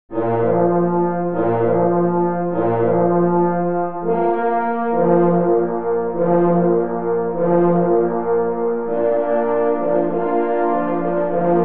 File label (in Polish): Hejnal na 3 parforsy; Hejnal KL im. Wojskiego w Gdyni